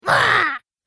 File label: Sinister Fiend, monster; Horror Sound Effects